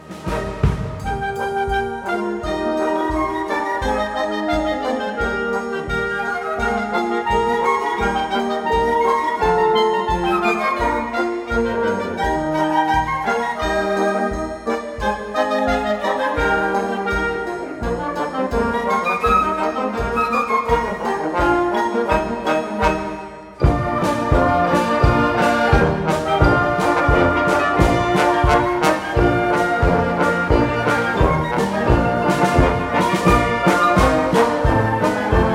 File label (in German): Polka, Blasorchester